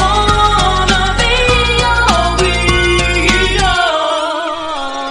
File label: add a female vocal melody